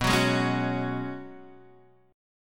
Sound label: C sharp 7th Suspended 4th B